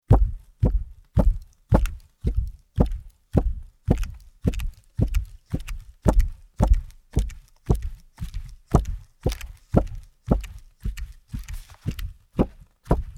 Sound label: buyon